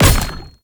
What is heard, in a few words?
Projectile Impact; Madurai; Ultimate Focus; Ultimate Abilities